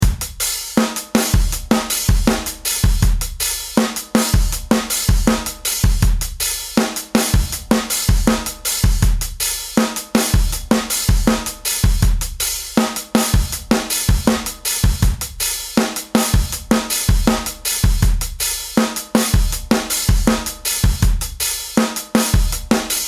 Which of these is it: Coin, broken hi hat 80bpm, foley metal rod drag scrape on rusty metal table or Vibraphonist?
broken hi hat 80bpm